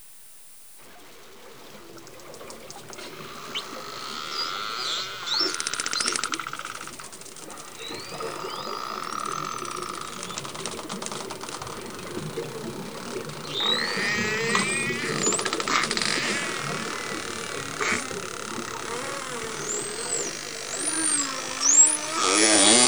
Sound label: Dolphins Underwater Communication; flipper